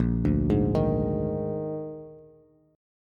Bb 11th, Bass; Bb11 arpeggiated; JGuitar

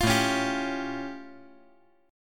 A Augmented 7th A; 10,9,9,10

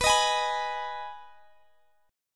G sharp Minor B, chord

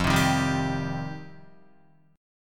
F 7th Suspended 2nd Guitar, chord; F7sus2; strummed; JGuitar

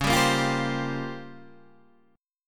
A sharp Minor 6th Add 9th C sharp, Guitar chord

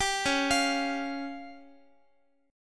C sharp Major Flat 5th, Guitar; C#Mb5; arpeggiated

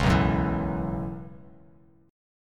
B Suspended 2nd Suspended 4th, Bass Standard; strummed; JGuitar

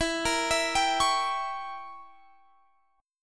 E Diminished 7th E down Guitar Half Step Down

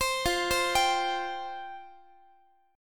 F Suspended 2nd F down Guitar; Fsus2 arpeggiated; x,x,15,15,16,18; 4A,4E,5A,5D,5G#,6C#

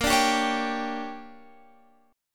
D Major 7th Suspended 4th Sharp 5th

